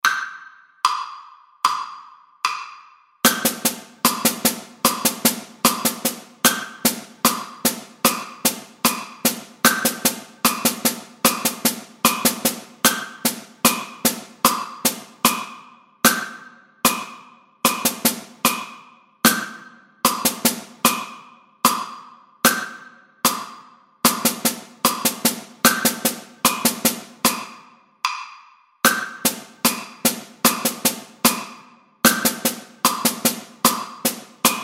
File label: drum set